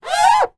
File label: wood polished single rub squeak; foley; zapsplat